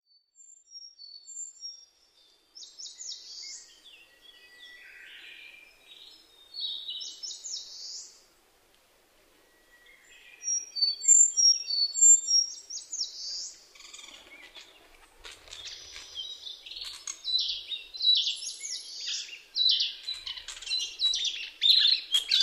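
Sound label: narcissus flycatcher fight; kibitaki kenka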